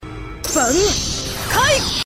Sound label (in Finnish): Puhelin soi, ringtones